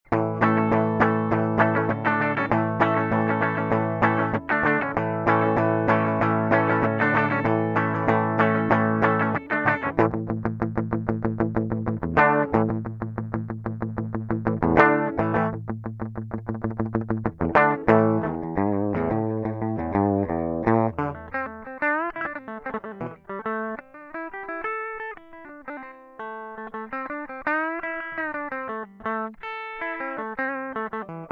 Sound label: axe dry; soft-clipping, acting more like an overdrive than a compressor; Reaper digital audio workstation; before